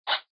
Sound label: zip fast